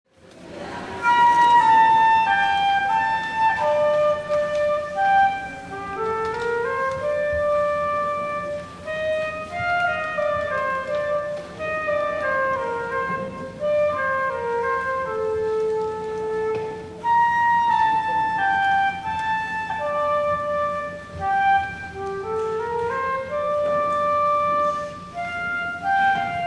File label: Minuet